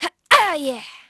GRUNT, VOICE; Pulse Racer

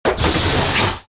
door; movers

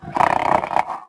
Growl; Alberto; Dino

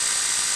security camera rotate loop

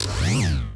droid servo

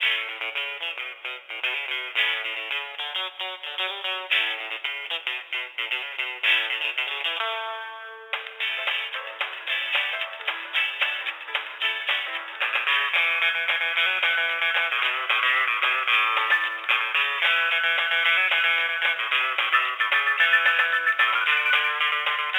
music elevator; TyTheTasmanianTiger3; XBOX

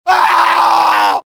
scream, pain death, male; Soldier Of Fortune II Double Helix; XBOX

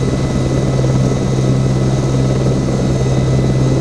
heli out loop

